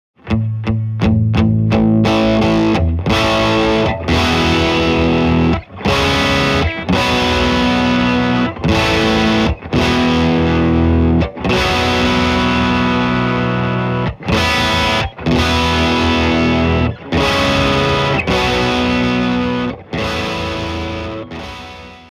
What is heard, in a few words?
crunchy guitar; Distorted Guitar